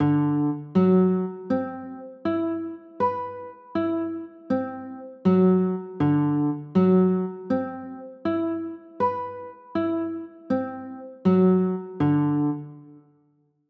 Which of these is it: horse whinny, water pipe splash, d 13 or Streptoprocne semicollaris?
d 13